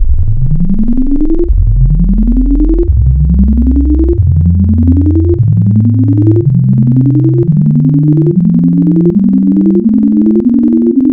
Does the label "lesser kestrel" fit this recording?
No